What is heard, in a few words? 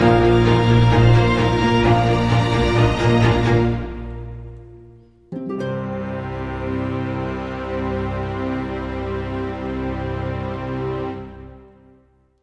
Long Sting